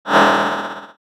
boss warning line

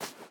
Footstep Sand

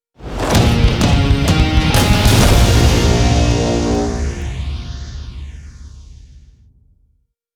Ui Bonus Win

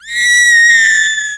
Cry

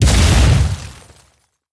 Building Part Smashed

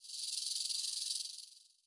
Poison Dart Snake

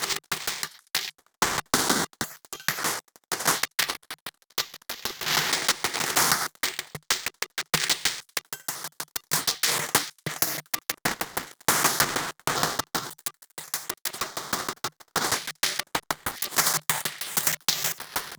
Drums DISTO CRUNCH; Cryptical Path

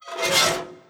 Spider Squeak

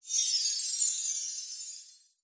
Sfx Baseball

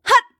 Fight Yell

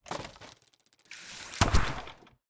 Normal Attack

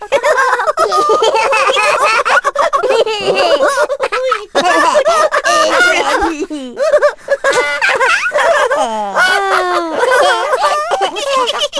Amb Laughing kids; Fur Fighters

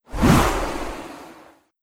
Spell Air; Legend of Keepers

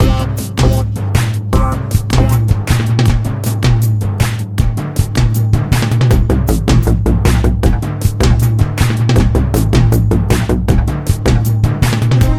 Outgame Music; Nickelodeon Super Brawl Universe; Android

